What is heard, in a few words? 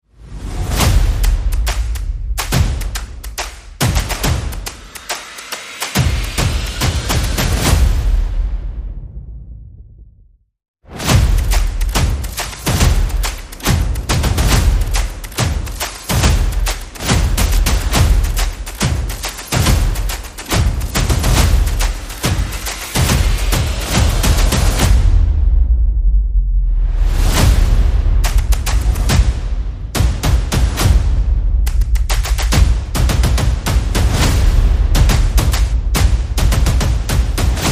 Percussion and Effects